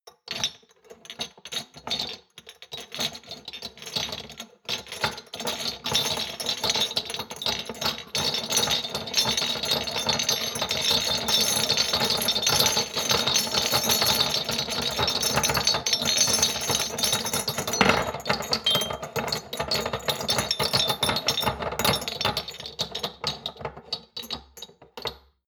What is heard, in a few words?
shot glasses rattle hard; berufe; gastronomie; soundpool